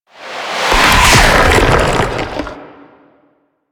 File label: Sand Spawn; Warframe; Man In The Wall; Enemy Gameplay